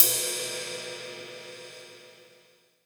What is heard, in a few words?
Ride; Vengeance Samples; VEE Cymbals